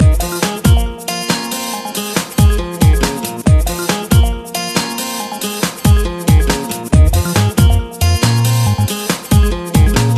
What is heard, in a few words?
Tingdingding